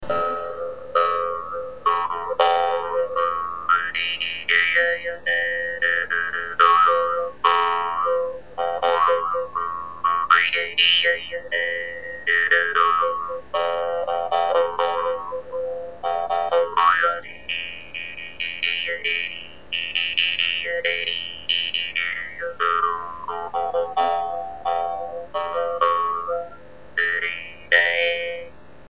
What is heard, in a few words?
dan moi harp; new harp decor; vargan